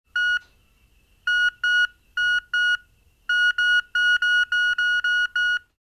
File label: Metal detector searching beeps; foley